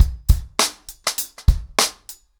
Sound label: 100BPM, ACOUSTIC DRUMS; The Roots Drumloops